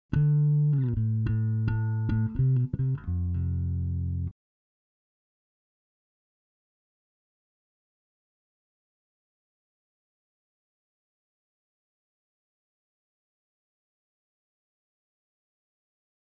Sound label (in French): basse